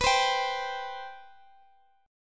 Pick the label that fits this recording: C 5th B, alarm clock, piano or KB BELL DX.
C 5th B